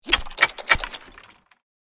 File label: Safe lock vault opening mechanism